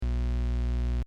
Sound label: fill